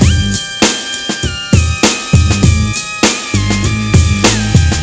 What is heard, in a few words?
loop